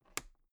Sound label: toolbox latch close loose soft